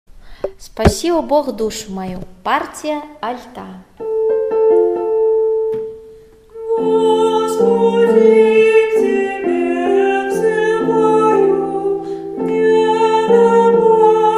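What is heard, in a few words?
alto; Gospodi k Tebe vzyvaju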